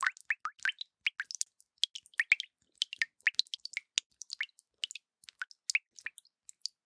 water drop potapota

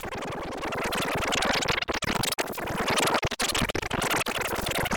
tape rewind

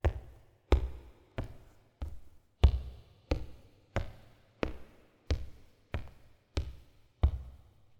walk gymnasium

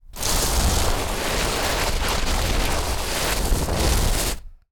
drag

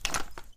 drawn katana

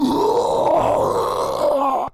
zombie voice

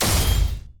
machine hard hit

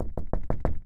wind knock

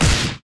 punch stop

